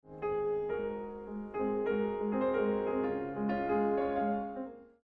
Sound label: Chopin Waltz A flat